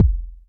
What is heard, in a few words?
Kicks, Elektron MachineDrum SPS1 MKII